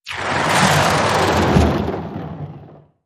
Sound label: Glitch Mechanical Slide